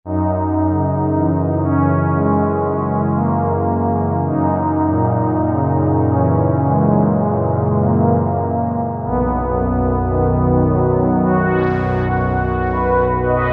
Flaw Analog, Roland V-Synth; Factory Patches

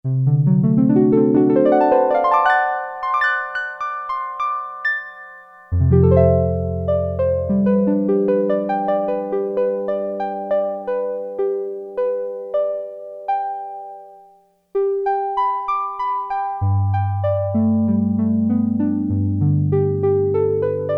HARP, Roland Jupiter-8; Factory patches

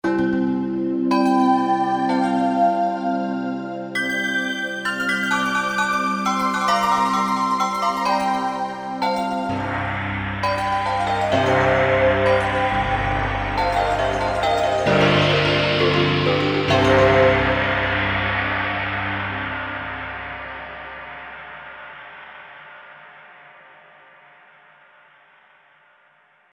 Chinatown, Generalmusic Equinox

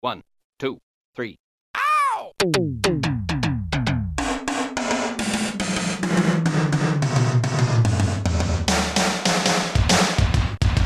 nostalgia drums, sampler; Guess the sampler game

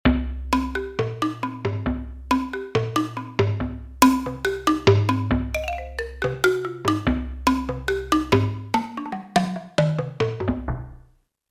Log Drum; Factory Patches

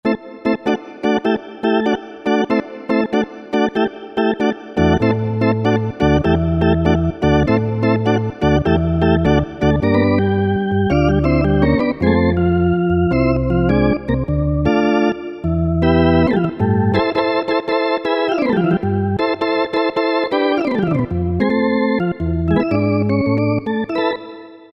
Even Bars, Yamaha PSR-3000 organ flutes; Factory Voices

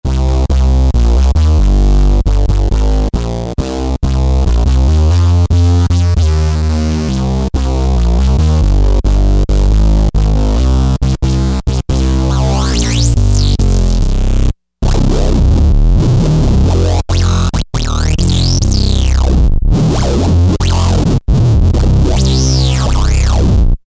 Amp Bass, Novation XioSynth 25

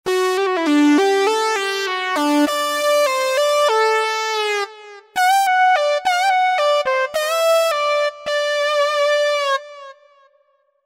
Roland V-Synth; A Leader; Factory Patches

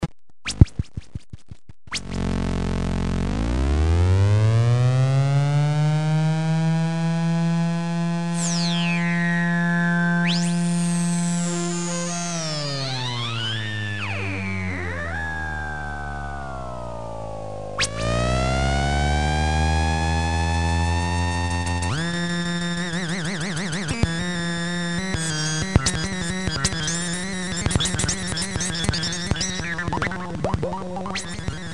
MG-1 crazy sounds, Realistic MG-1 Concertmate

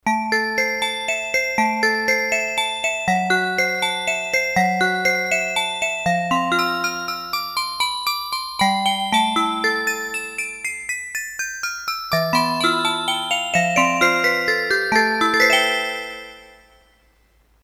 Southern Wind, Roland D-10; Factory Patches

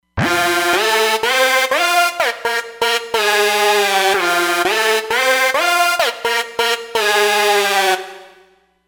Intense Lead, Roland Fantom XR

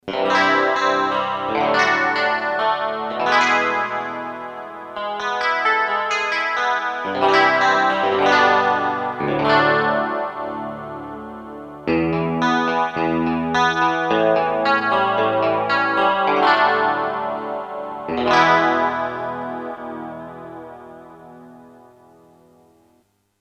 GUITAR; Korg DSS-1 Sound Library

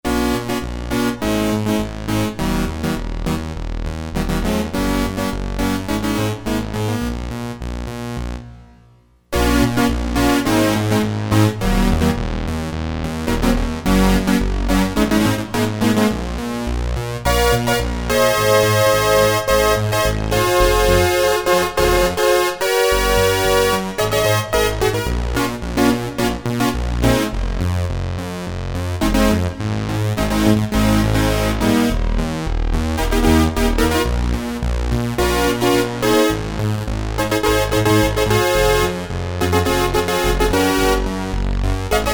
One Osc to Two Osc, Sequential Circuits Prophet-5; Sequential Circuits Prophet-5 (Ver.3)

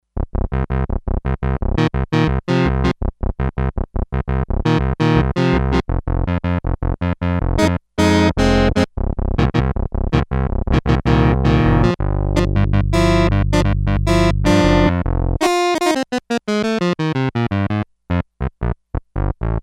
Clavet, Sequential Circuits Six-Trak; Factory Programs

